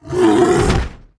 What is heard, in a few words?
bear death; druid henge